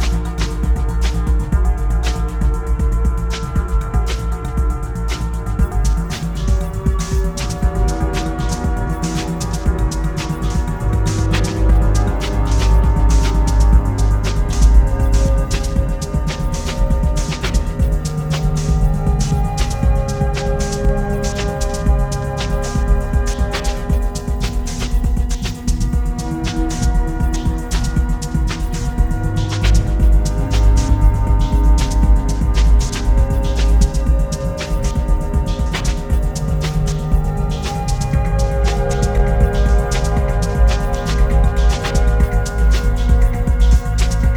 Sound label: atmospheres; killerdungeon